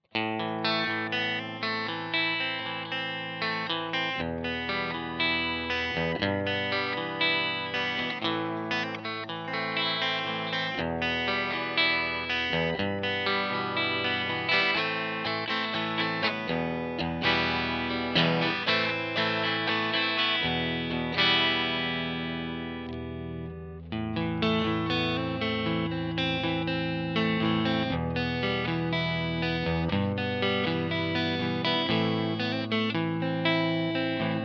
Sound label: PR12 Strat